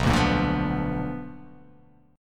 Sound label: B Minor Major 7th Flat 5th, chord